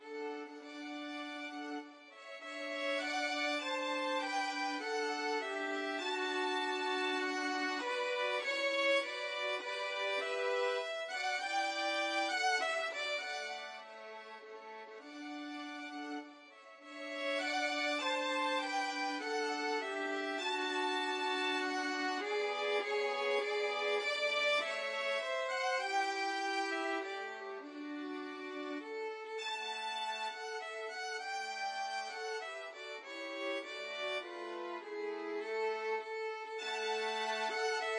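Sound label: nocturne violins, violin trio; mendelssohn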